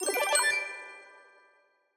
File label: Magic wand, notifications; Google; Google Sounds; Gems